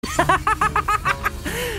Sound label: Angel laugh